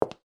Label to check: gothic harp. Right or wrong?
Wrong